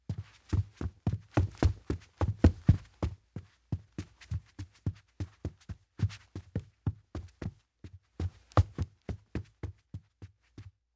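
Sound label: Footsteps Run Stairs Hollow Hard; Fairlight Sound Lib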